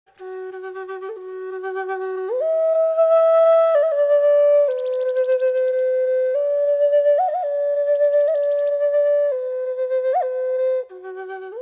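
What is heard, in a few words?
native american flute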